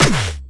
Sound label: Enemy Sandwall Block